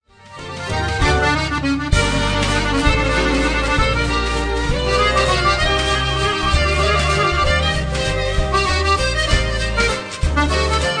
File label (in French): impression musette